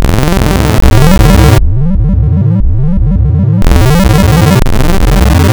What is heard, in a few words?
Add NonSine, wavetables; Architecture 32